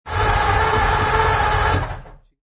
Classic Not Turning Over, Old classic engine does not start; Classic Car Not Turning Over; Cars